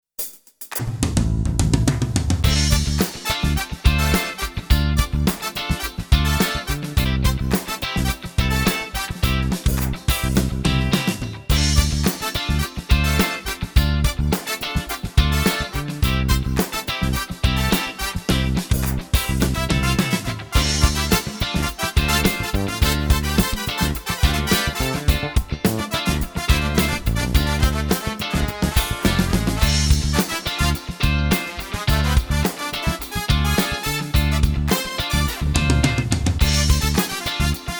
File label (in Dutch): KRAFTCLUB GITAAR